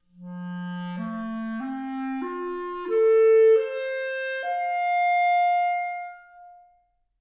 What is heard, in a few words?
clarinet